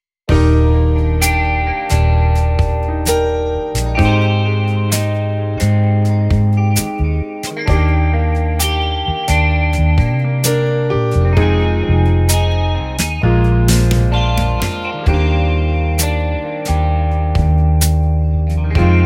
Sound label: Slow Pop Ballad, flutist; Practice Song No 20; using the whole tone scale in improvisation